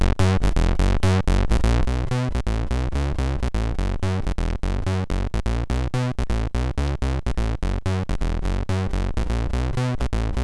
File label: Simple Bassline, MOS6581R2